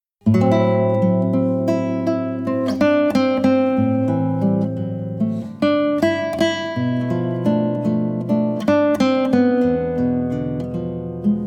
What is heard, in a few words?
Gentle Rain